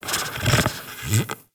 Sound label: Tame Sleep Idle Snore Fidget; Enemies; Warframe